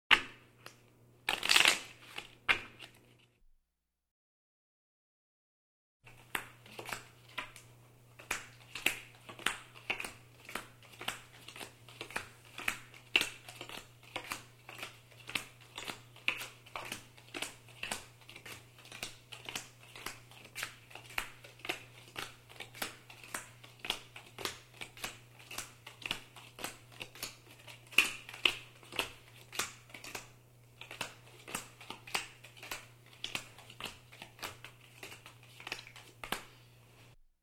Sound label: Shuffle and deal play cards